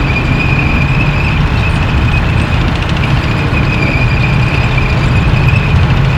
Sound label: tank run